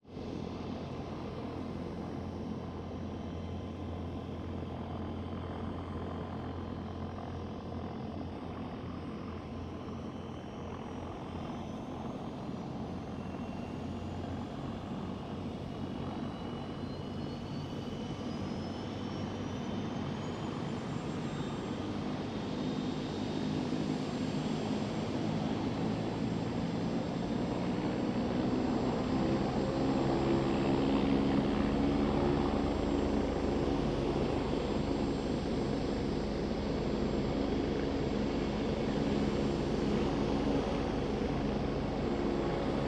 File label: Helicopter, Bell, 222, Idle, Grow, Take Off, Away - faint background birds